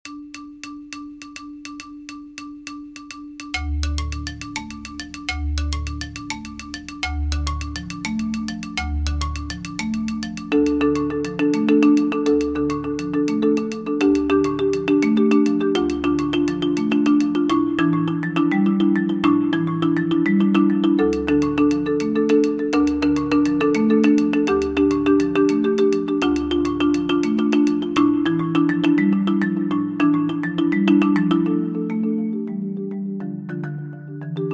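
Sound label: africano, synclavier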